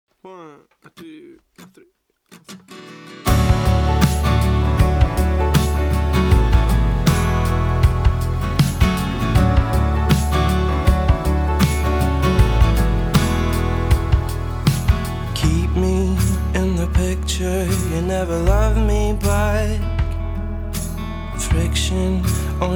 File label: Acoustic